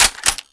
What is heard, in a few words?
famas bolt slap; weapons; cstrike